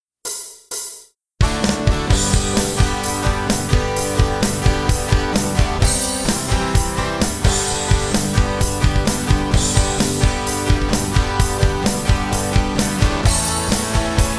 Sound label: acoustic guitar; brick and mortar; start up optical